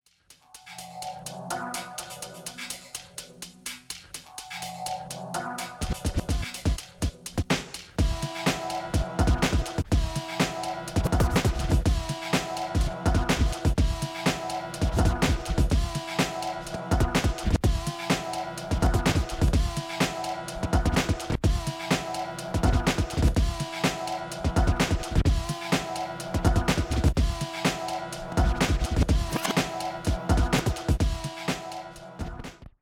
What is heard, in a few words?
juggling halfspeed bassdrum